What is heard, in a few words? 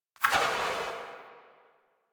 Omicrus Tail; Warframe; Quests; The New War; Weapons